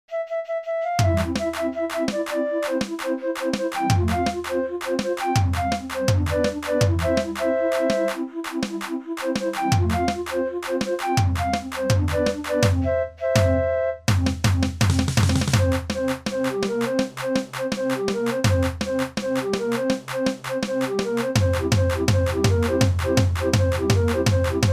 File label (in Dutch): Happy Hardcore medley, draaiorgels